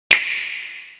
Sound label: tap switch tap